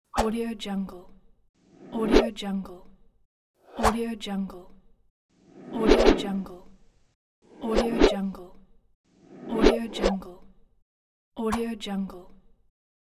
fast flying

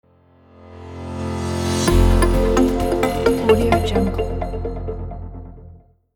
intro accent logo

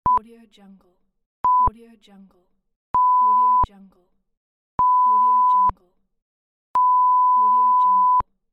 censor beep

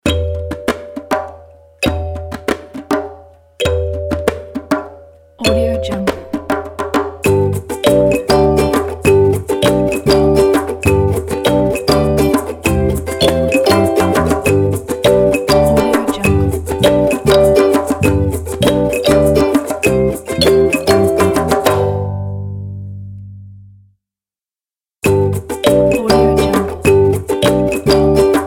the rainforest sun ident

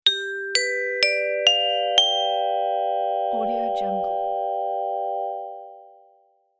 storytime beginning

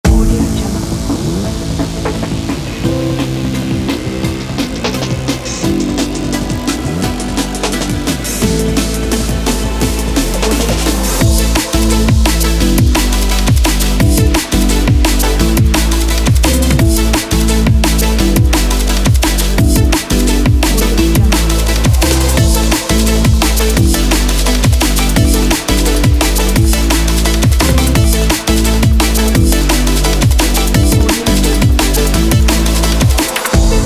pumping drum and bass

